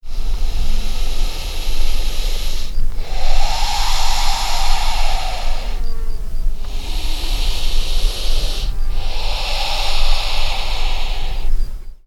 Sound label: pituophis hissing